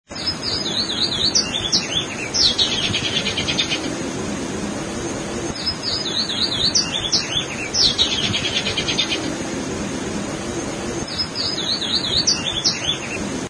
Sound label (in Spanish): voz arañero cara negra